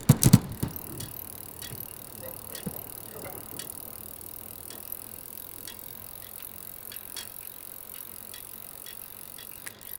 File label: the sound of a bicycle